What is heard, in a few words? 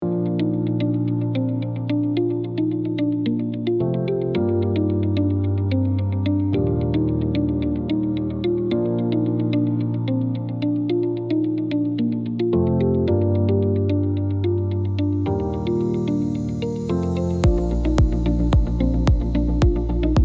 Corporate elegant